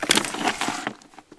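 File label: night vision off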